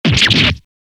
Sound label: Large Damage; Gyakuten Special CD